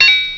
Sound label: Get Gold; Mythos